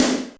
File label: Snare Drum